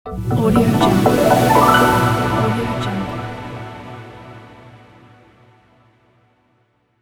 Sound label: stylish evolving motion logo